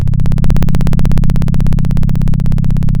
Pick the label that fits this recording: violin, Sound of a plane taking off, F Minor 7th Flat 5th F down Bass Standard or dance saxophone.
Sound of a plane taking off